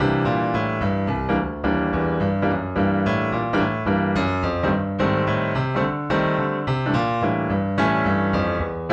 piano wet

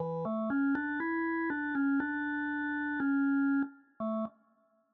organ

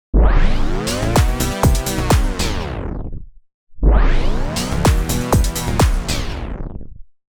EDM Tapestop

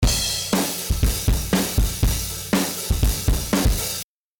Mini V Efx Soft Clipping

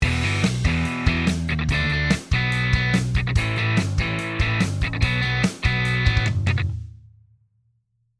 GTR Stomp

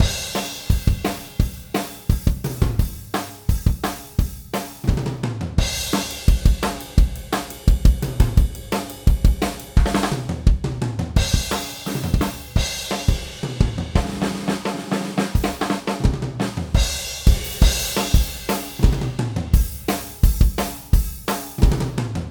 Tape crosstalk on

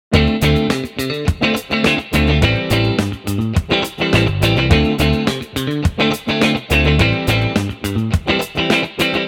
real strat

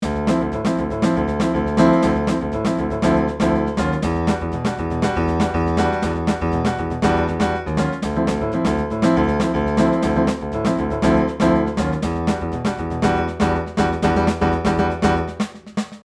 piano

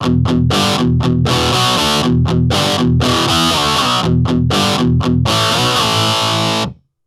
Guitar U87

